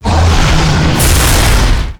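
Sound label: wolf attack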